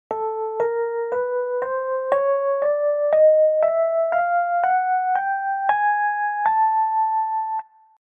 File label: Chromatic Asc